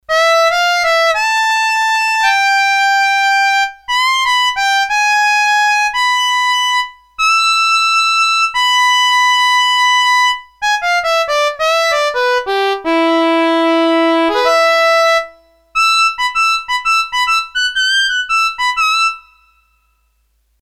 Harmonica, Roland D-10; Factory Patches

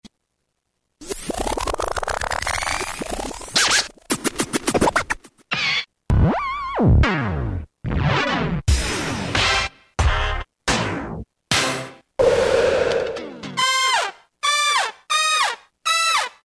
Scratch; Roland M-DC1

